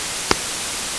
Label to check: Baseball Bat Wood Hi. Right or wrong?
Wrong